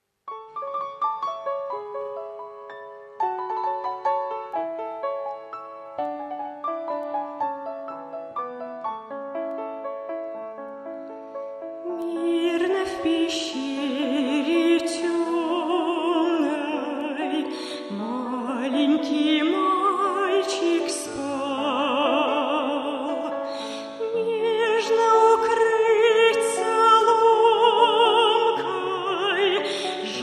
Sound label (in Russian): sviatoe penie